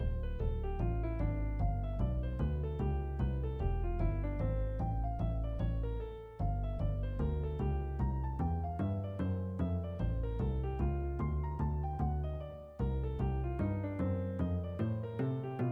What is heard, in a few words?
pettern scale